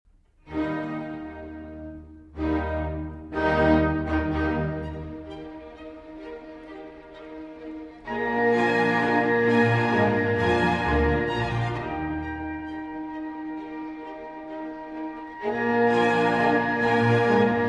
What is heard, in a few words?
Sinfonia Concertante in E-Flat Major for Violin, Viola